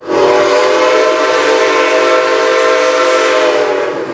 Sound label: horn